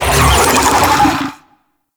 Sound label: Infested Big Ashen Vocal Attack; Warframe